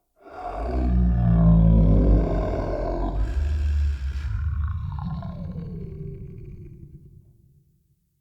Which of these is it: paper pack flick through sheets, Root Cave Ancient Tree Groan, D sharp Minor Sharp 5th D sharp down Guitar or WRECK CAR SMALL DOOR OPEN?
Root Cave Ancient Tree Groan